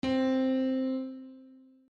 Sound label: pianino DO